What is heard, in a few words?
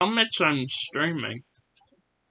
rock